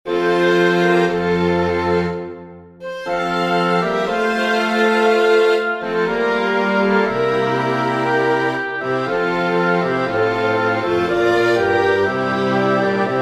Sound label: sinfonia for winds strings; haendel georg friedrich; from giulio cesare